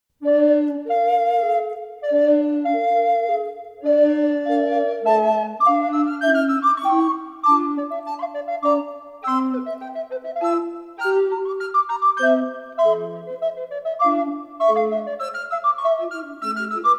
Rondeau SATB, recorder; Purcell; Abdelazar

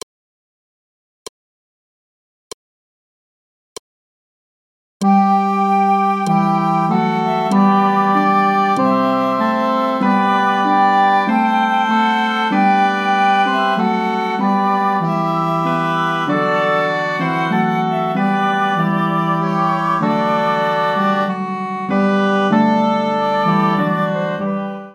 tenor canon, recorder; Isaac; Innsbruck

